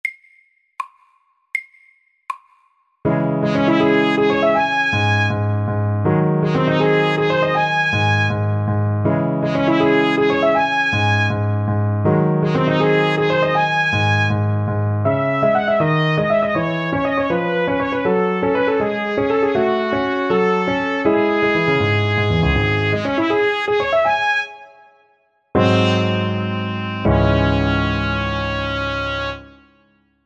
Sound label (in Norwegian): Prelude i Ess moll MIDDELS